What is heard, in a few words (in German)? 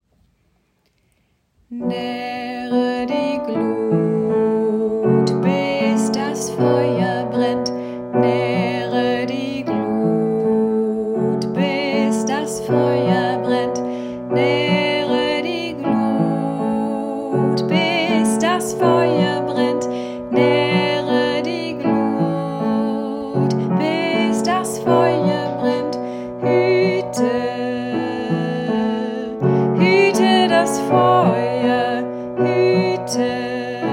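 Hüte das Feuer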